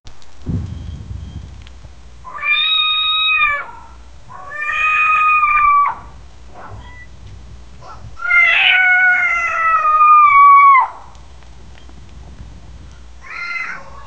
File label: army of cats roaring; funny